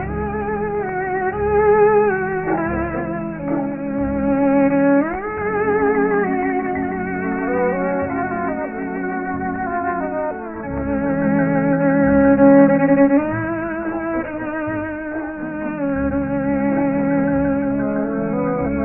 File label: strings, Sad Loops